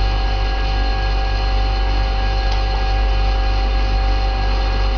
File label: fridge hum